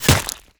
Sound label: bullet impact ice